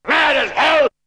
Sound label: Vocal; ZERO G - Datafile DISC 2